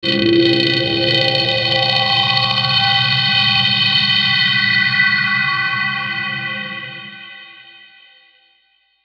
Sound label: Ascend Static Filter Flange; Blastwave